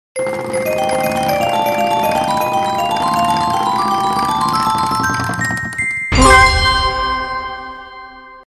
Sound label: wheel spin